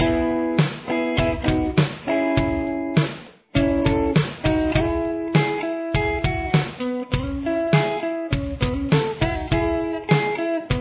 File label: guitar verse